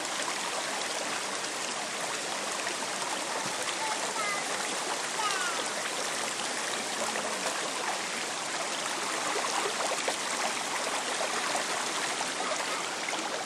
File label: gurgling outflow; newstead abbey